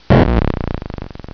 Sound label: Killed; video game sound effects; Space Invaders